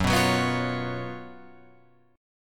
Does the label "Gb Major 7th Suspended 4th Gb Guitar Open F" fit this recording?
Yes